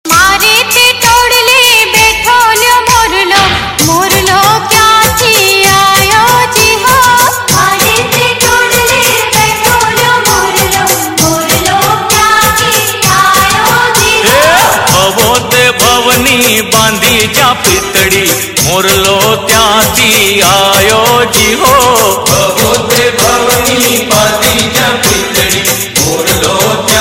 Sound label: gujarati garba tone